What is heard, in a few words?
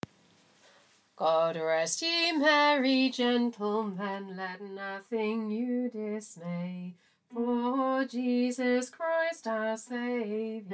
tenor; God Rest Ye; Christmas